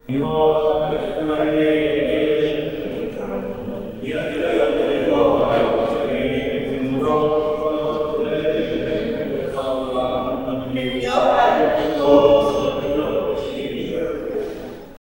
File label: eastern orthodox small cr, religious